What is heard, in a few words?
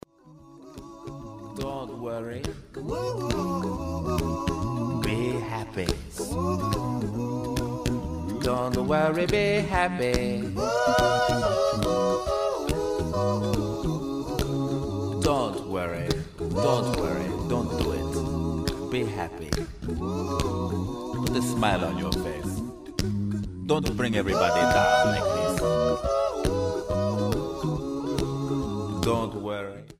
ringtone